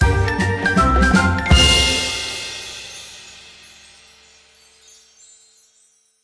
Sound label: gift end; netent